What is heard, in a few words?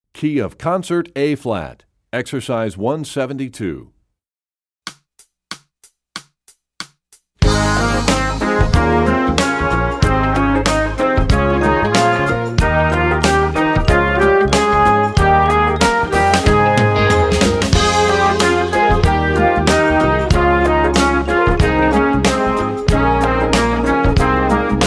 Studies Concert Ab; EE2000 Book2; Rubank